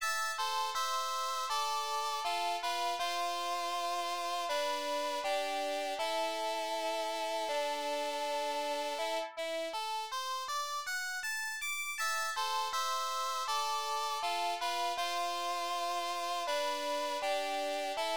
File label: Rest in Peace (Harmonica Ver); Ys; Ancient Ys Vanished; X68000